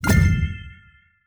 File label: win